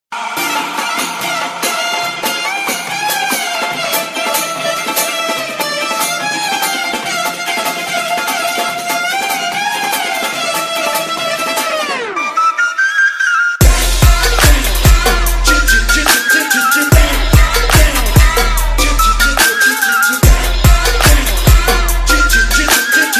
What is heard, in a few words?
Scratch